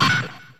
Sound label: perc sine hit